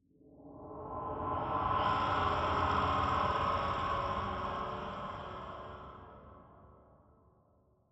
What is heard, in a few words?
mood, ambient, nether, crimson forest; minecraft